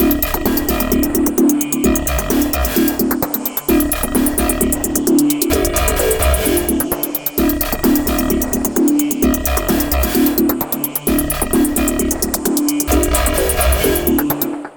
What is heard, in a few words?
Downtown Loop